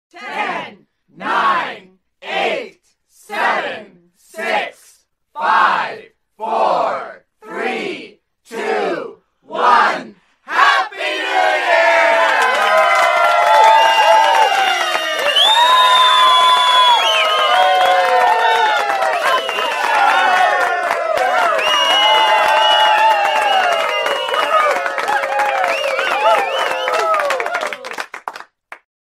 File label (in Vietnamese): tieng dem nguoc happy new year 10s va vo tay reo ho